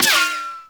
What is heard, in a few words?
Sniper Ricochet; Warframe; Frumentarius